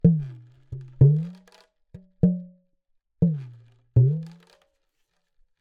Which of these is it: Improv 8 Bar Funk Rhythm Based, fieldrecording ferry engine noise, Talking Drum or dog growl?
Talking Drum